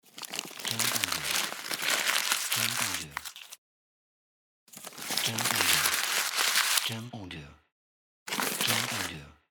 Paper Unfolding

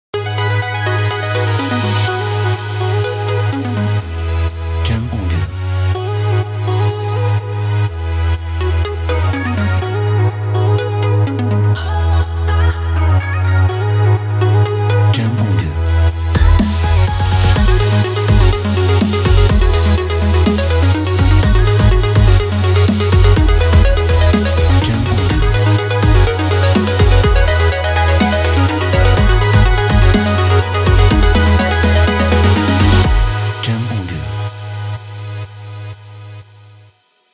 Energy Pop Corporate

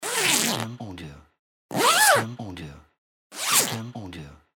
Zipper

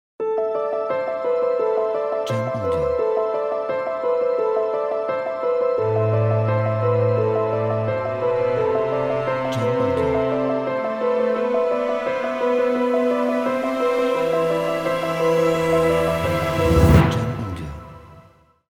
Dramatic Piano Trailer

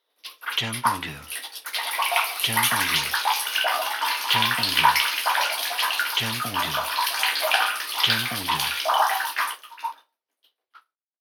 Drops in Shower

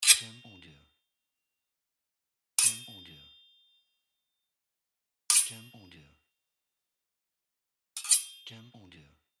Sword Strike

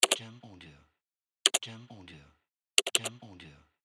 PC Keyboard Click

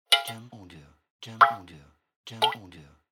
Falling Drop

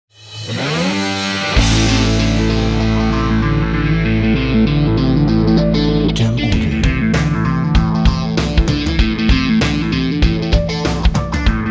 Monster Metal Powerful

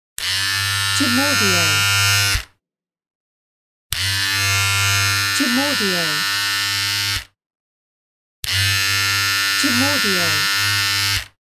Electric Shaver

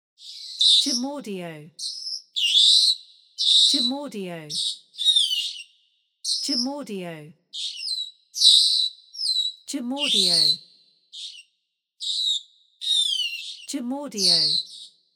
Singing Birds

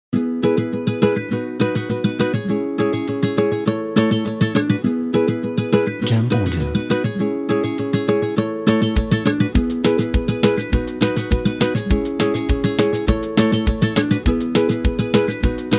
Happy Story (Folk Background)

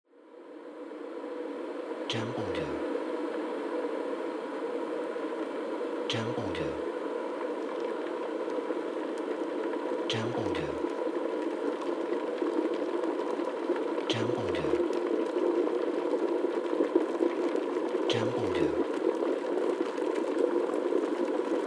Boiling Kettle

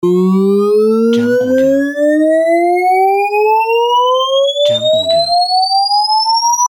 Electronic Riser

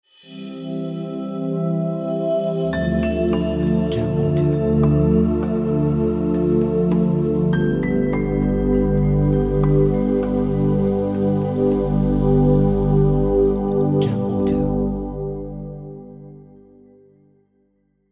Meditation Intro

